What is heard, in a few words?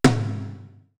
ATOM, DRUMS; RY30DRUMS; East West; Global Sounds